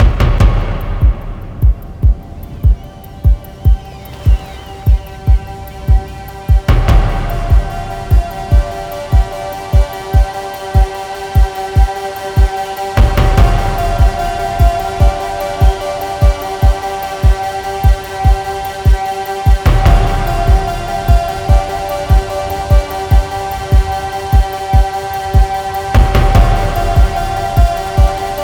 Music Loading; Battlefield 3

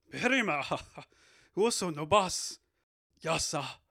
outro

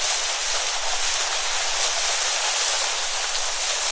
Vehicles Generic

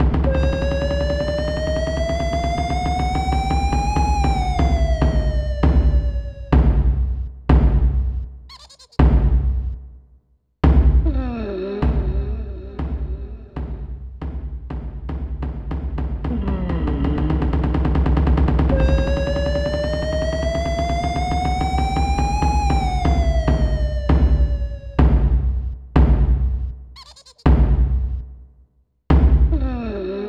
Funny noise; n64 gamerip 1998; getter love cho renai party game

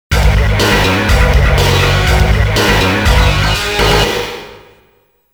Bonus Battle Start; king of the monster 2 the next thing neo geo cd

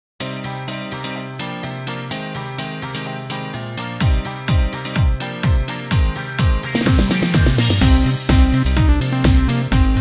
Tap Dance

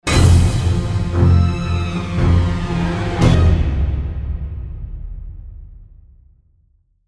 Stinger Danger; Stronghold Legends

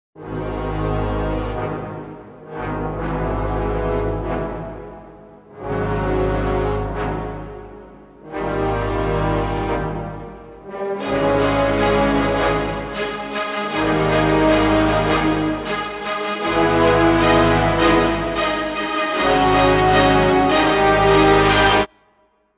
Boss Fight

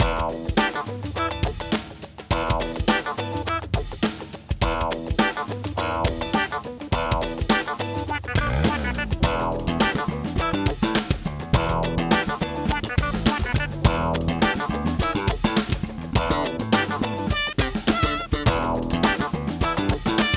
Menu Lobby Option Black Market BGM Loop; crossfire online

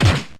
Sucker Punch; PRSFX- Sucker Punch

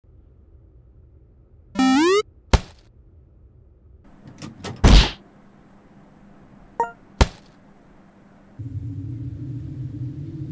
Power Jump

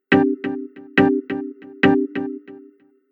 Beams

